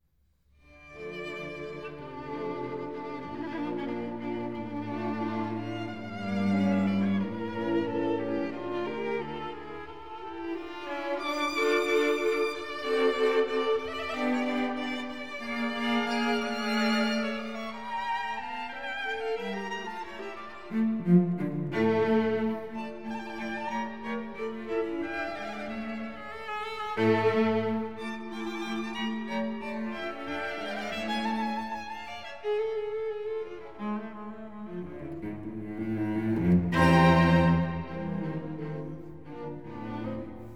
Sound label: String Quartet No. 15 D minor first movement; Mozart; Legend of Galactic Heroes; Galactic Empire SIDE